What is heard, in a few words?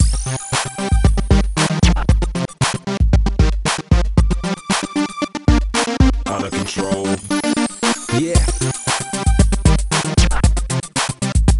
Band No Vocals; Fictional Band 2